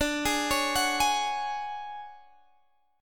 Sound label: D Major 7th Flat 5th D down Guitar Open G